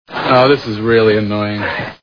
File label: annoying